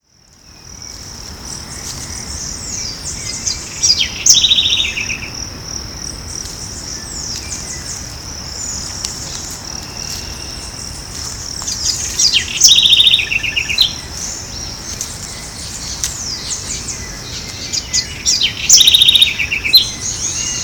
Troglodytes musculus; Ibirapuera Park; SP; Brazil; Silveira

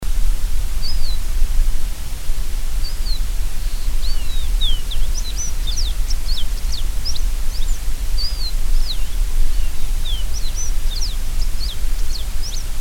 Sporophila bour

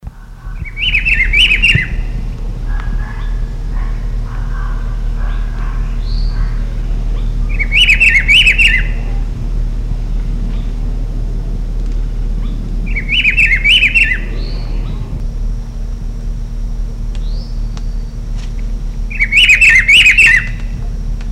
Cyclarhis gujanensis; Serra da Cantareira; SP; Brazil

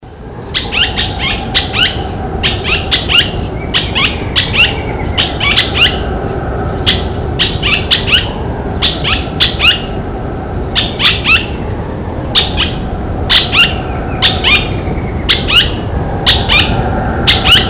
Automolus leucophthalmus; Cantareira S Park; Brazil

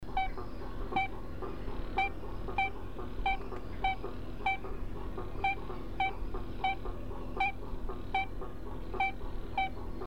Aplastodiscus leucopygius; Itap da Serra; SP

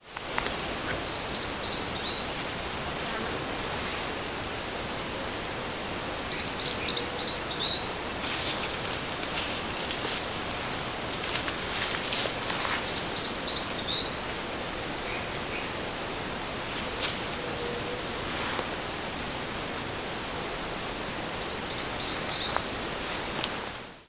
Myrmeciza squamosa; Bertioga; SP; Silveira

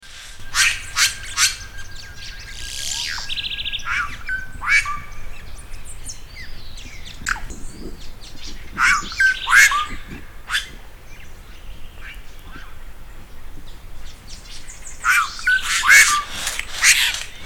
Cacicus haemorrhous; Itatiaia NP; Brazil

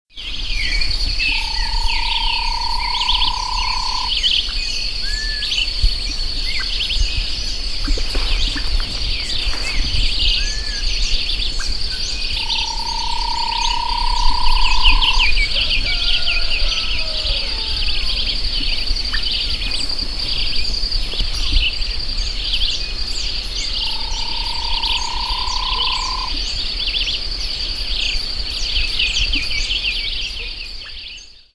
Scytalopus indigoticus; Intervales S Park; Brazil; Silveira

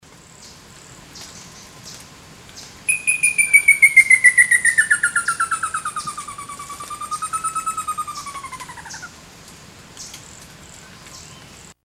Merulaxis ater; Itingucu; EE Jureia; SP; Silveira

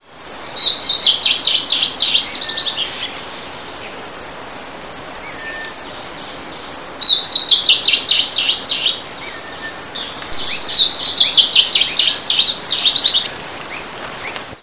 Drymophila rubricollis; SP; Brazil

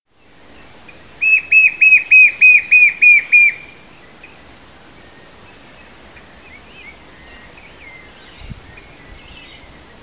Pyriglena leucoptera; Serra do Lopo; Extrema; MG; Silveira

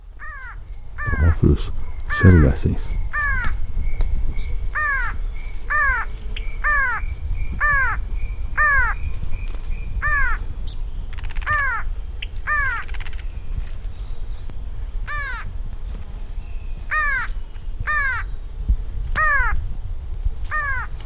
Thamnophilus caerulescens; S Ant Pinhal; SP

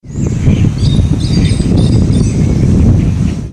Turdus subalaris; Ibirapuera Park; Sp; Silveira

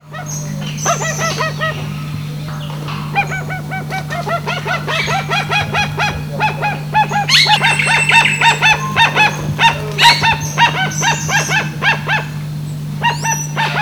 Penelope ochrogaster; Silveira

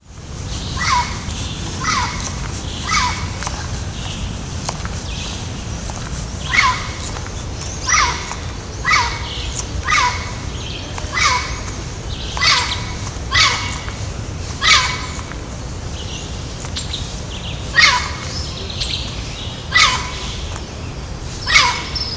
Amazona aestiva; Ibirapuera SP BR; Silveira

